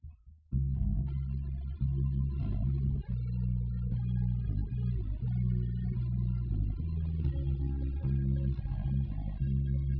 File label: nodrums inst sim; SASSEC07